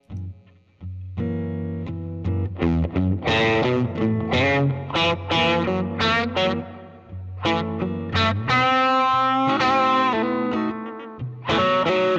Dirty Bridge Am Std Tele, pickups

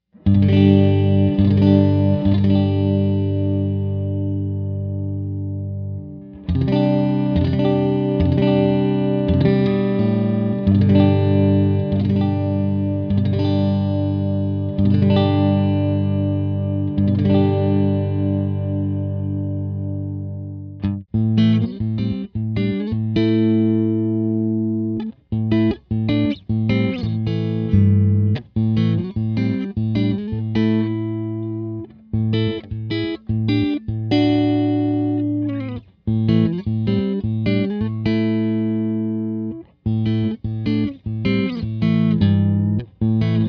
neck clean

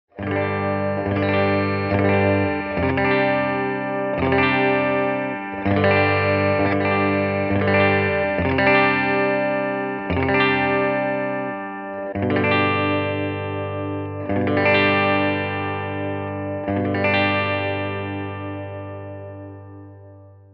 bridge clean

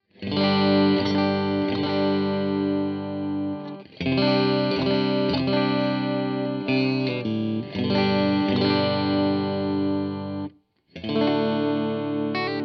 Turk Bridge Middle Clean, pickups; Revel

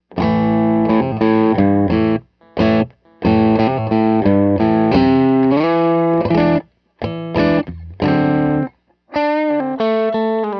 dirty bridge to neck, pickups

